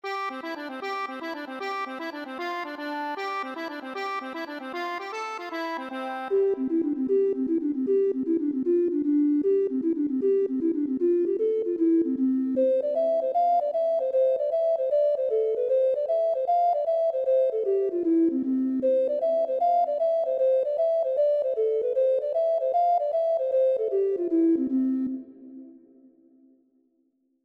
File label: accordion